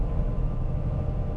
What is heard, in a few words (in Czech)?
trezor; Mafie 1